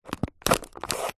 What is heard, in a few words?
plastic disposable cup stab with knife